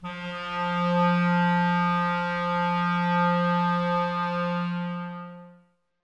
WND CLR, Clarinets; Miroslav Vitous - Woodwind Brass Ensemble; 90's Sample CDs

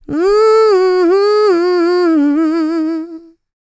LICKS, Vocal Planet, R&B; ILIO; Dance; LT R+B

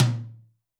tom, Zoom MRT-3B

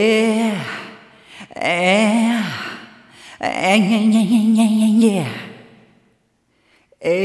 Rhythmic A

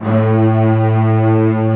STR SEC A1, STRING SEC

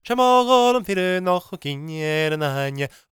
GAELIC, World Voices; ILIO; Vocal Planet

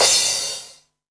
CRASH, Dance Mega Drums; Best Service; CRASHES

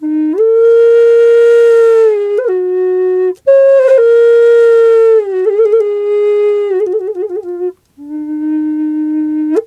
EF wild flute; Big Fish Audio - Didgeridoo and Other Primitive Instruments

